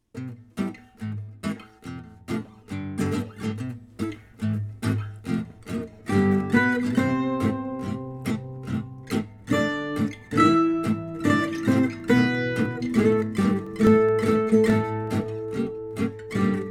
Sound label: rough melody sketch